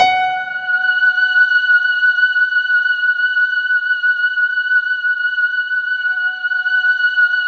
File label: F#5, Synth Line; 90 s Sample CDs; NiceBeats; SynthLine VOL-22